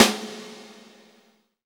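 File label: Snare, Roland; Real Snrs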